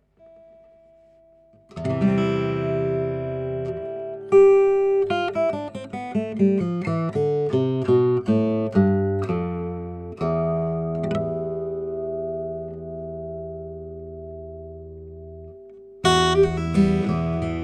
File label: yamaha lj 26